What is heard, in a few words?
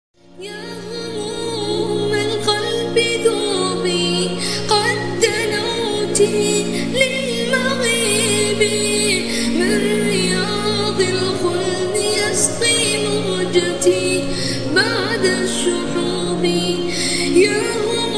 Ya hamumal Kalb, Vocals Only, nasheed